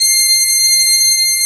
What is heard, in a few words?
PIPES C6, organ; AKAI S-Series CD-ROM Sound Library VOL-2